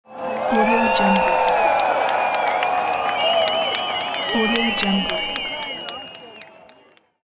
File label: people applauding screaming concert; human sounds; جمعیت در حال تشویق و جی